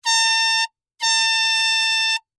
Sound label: Air Horn Blow, Sports, Air, Horn, Blow, Twice, Handheld; Miscellaneous Sports; BlastWave